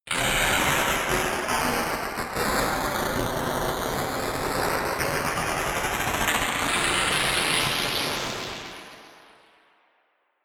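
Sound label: scuttle, science fiction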